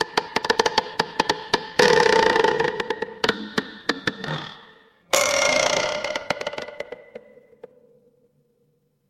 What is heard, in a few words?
horror creak